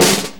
Snare, Snare Drum; One Shots; Drum Tools

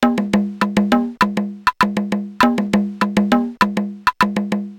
Korg MR-16 Perc (100BPM); musicradar; cyborg beats

